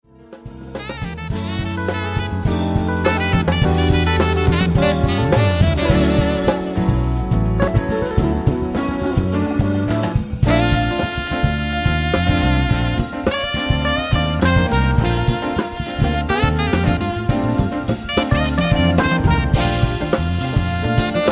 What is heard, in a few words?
Funk